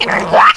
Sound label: talk, headcrab poison; hl2